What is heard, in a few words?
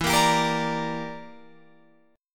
E Minor 7th Flat 5th, Guitar, Open F; strummed; Em7b5; 16,17,19,17,17,17